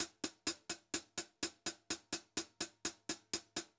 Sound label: 126 HH, Pop-Rock Drumloops